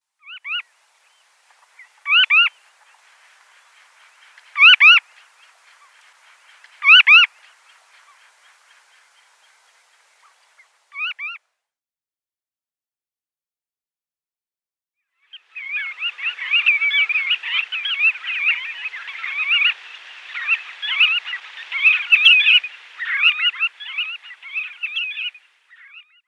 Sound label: Knot calls